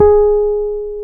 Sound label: G#5, Pianet; Keyboards of The 60's and 70's; H.Pianet